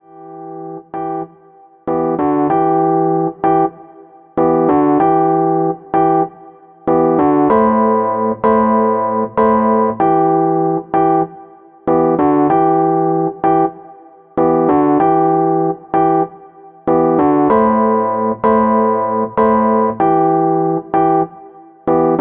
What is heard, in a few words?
Rhodes chord, hip hop chords; rhodes chord 13